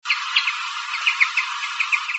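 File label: brambling; アトリ; 冬鳥で常に群れているということですが、我が家で見たのは１羽だけでした。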